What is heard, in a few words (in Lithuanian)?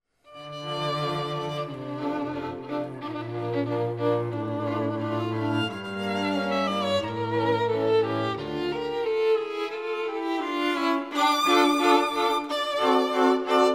kvartetas; Mozart